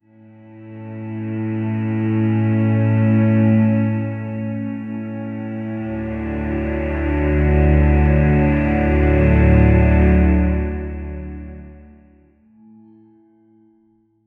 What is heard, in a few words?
low cello swells and bows